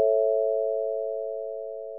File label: Just A Maj